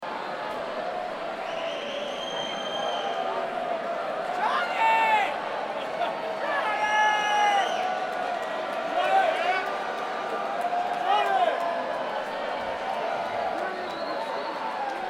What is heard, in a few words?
Crowd